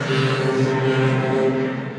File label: Robotic Flight, Disembodied Voices; Multisampled; Instruments; Ableton; Mood Reel